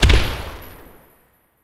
Result Fireworks; Pac-Man Championship Edition 2 Plus

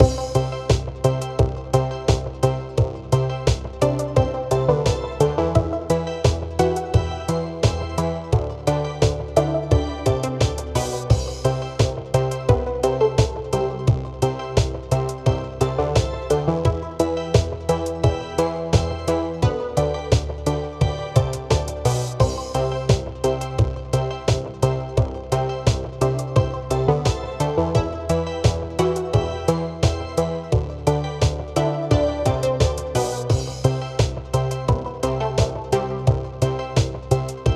cow Disco, soundtrack; minecraft story mode gamerip 2016; Assembly Required

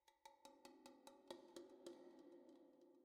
Spring Drums; Frozen Flame; windows

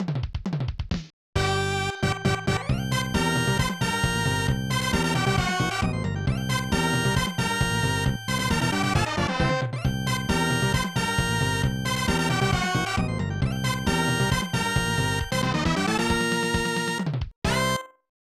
Demo Fanfare; Super Genjin 2

